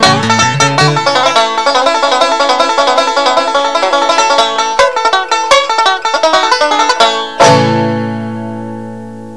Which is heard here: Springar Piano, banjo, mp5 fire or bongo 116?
banjo